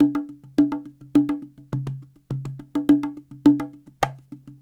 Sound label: Cahon